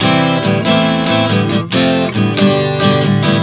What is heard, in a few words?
C-Am-F-G 140 bpm, acoustic guitar chord progressions